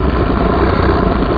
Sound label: Growl D Monster